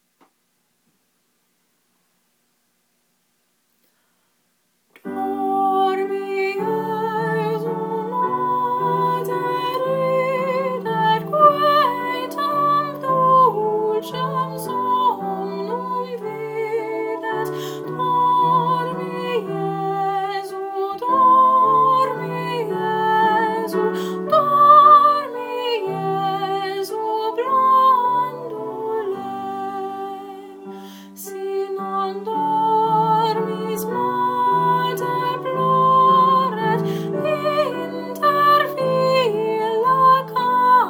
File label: dormi jesu melody